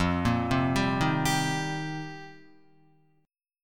F 7th Suspended 2nd Suspended 4th, Guitar; F7sus2sus4; arpeggiated; JGuitar

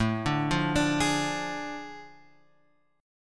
A Major 7th Suspended 4th Sharp 5th; AM7sus4#5 Chord {5 5 3 X 3 4} arpeggiated

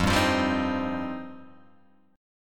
F 7th Suspended 2nd F down Guitar Open G

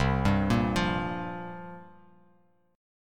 C 7th Suspended 4th, Bass Standard; C7sus4 arpeggiated

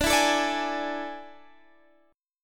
A Major 7th Suspended 4th D

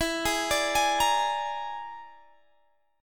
Bb 7th Flat 5th E

